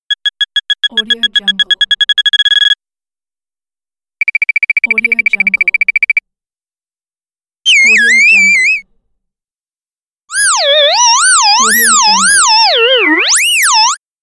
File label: scan for metals